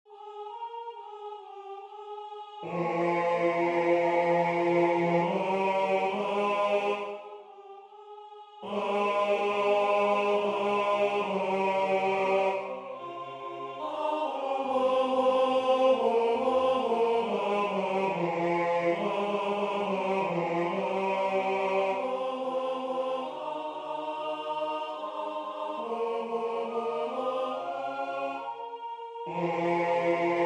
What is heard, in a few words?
Tenor, chorale; Ave Maria; Aymé Kunc